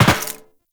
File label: Land Metal Perforated, Footsteps; Warframe; Tenno